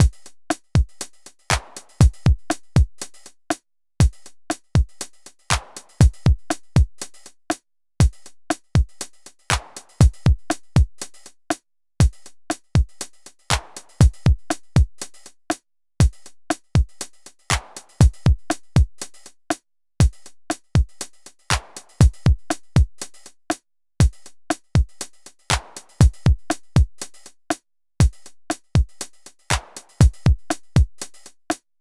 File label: G 120 Drums